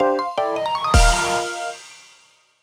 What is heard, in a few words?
Jingle Finish Western; clubhouse games 51 worldwide classics 2020 switch gamerip; Light Board